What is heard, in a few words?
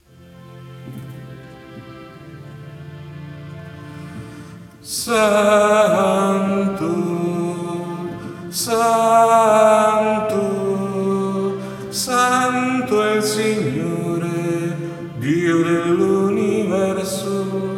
Soprani; Santo G M Rossi